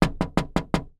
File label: household door knocks thin glass wood multiple; zapsplat